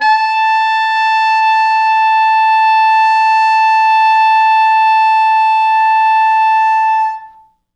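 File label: tenor sax susvf ext. range, A4; East West; Quantum Leap Horns Sax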